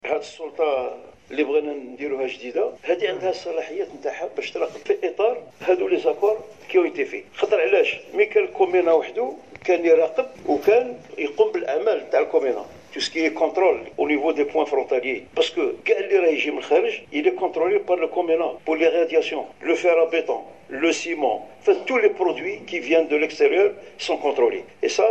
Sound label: guit